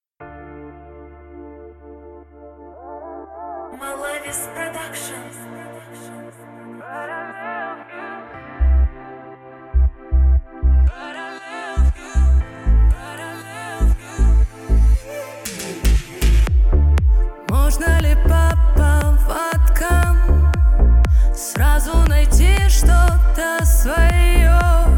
Bass

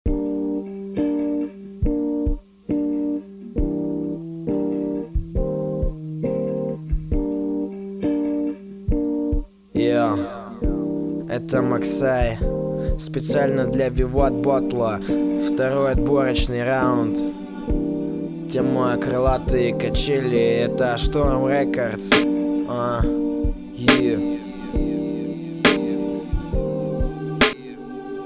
HIP-HOP; VIVAT HIP-HOP 2 round

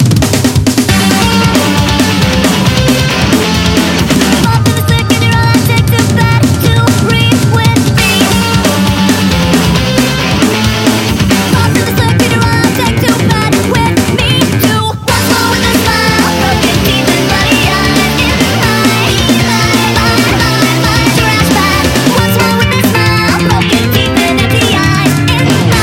nightcore soft nightcore

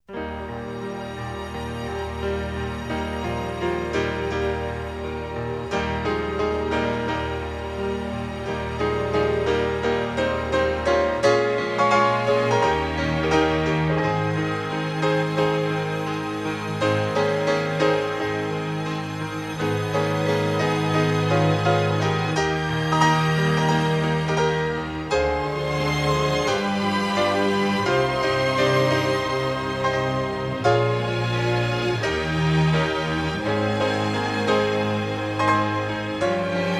Brass Strings

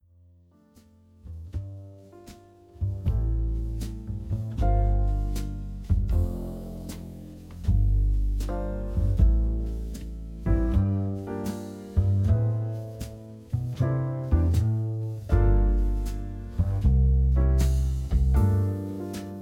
Smooth Background Jazz Urban Bass Beats

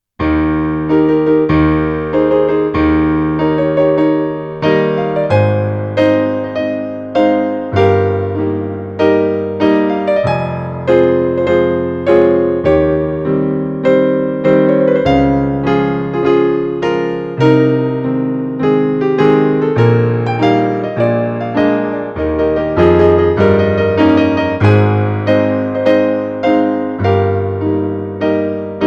piano, Grand Battement

